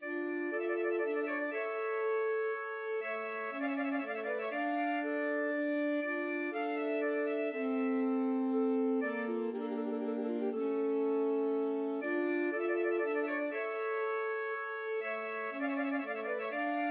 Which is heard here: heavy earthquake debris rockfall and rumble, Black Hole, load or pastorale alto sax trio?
pastorale alto sax trio